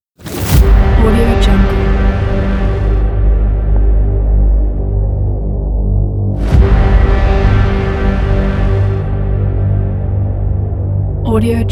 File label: metal trailer action